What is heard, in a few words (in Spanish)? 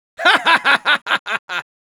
laugh happy; Spy; Risa feliz